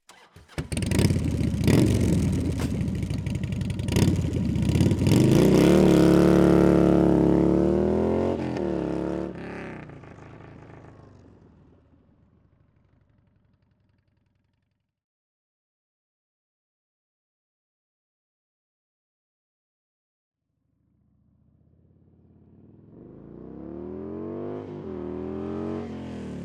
Harley Davidson Night Train - EXT - FAST - Start Away Up Turn Away By Up Stop Off; 2004; MS Decoded